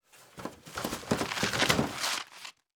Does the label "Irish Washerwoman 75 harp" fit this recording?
No